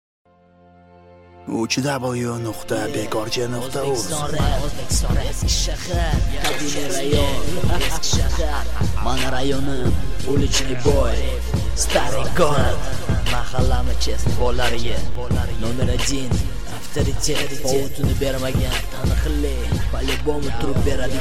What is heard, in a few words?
Rap